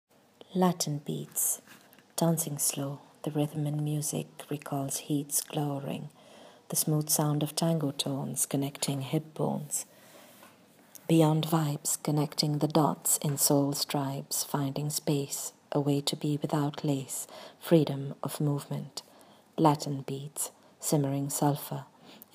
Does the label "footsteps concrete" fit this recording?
No